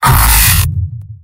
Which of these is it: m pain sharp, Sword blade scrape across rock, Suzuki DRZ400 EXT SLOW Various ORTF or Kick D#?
m pain sharp